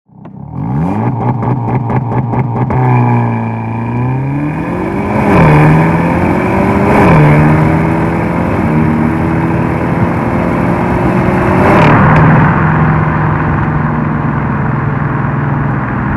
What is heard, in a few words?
Elantra N